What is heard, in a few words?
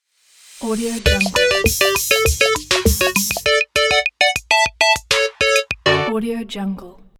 logo retro game